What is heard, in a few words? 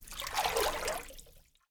water move